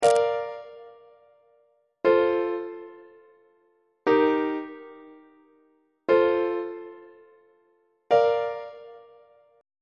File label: b flat major plagal cadence on treble clef